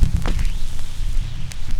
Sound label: CRACK; E-Lab; X Static Goldmine 2; akai